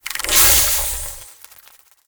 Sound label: Jeweled Scarab Attack